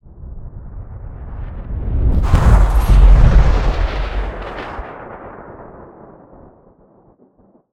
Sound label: explosion whoosh, Designed Explosions; Bluezone Corporation